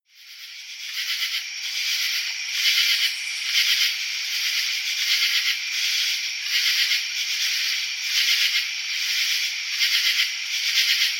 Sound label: August Night Sounds; Quinton; NJ